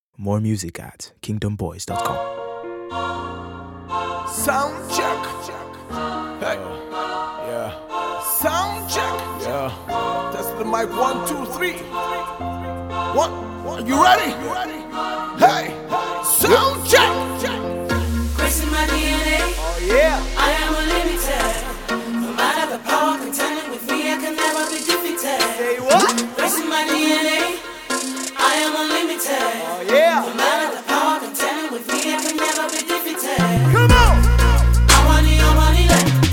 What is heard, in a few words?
Soundcheck Trumpet